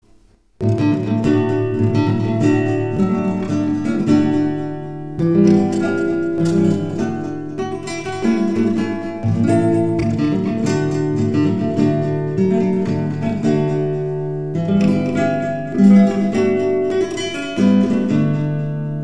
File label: jingle bells; pierpont james